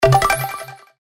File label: send Button